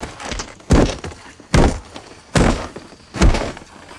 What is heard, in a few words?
synchronized heavy boot pivot and stomp; foley